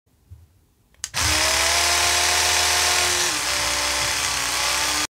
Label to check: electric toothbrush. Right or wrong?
Right